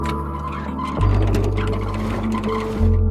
rollender wagen; Machinarium; gamesoundopedia

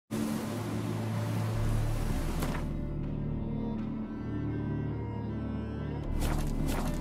menu ausgeblendet; The Witcher 3

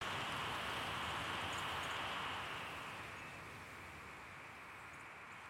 starker wind; Stardew Valley

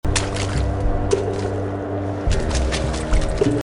wasser; Unravel Two

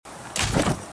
nehmen; The Flame in the Flood

